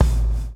BLOW; Spectrasonics - Backbeat

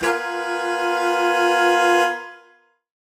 Horn Swell Alt Fminb5; undercover samples

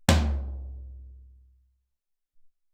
Deep Tom, Alesis SR-18

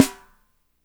SNR; Spectrasonics; Burning Grooves

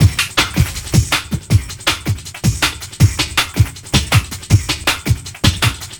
Classic Beat, Drum N Bass

